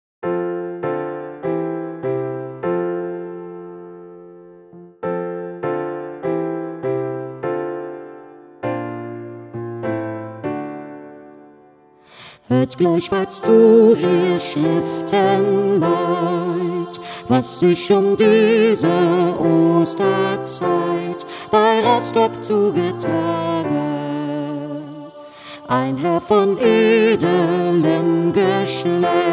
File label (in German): Sopran Bass Piano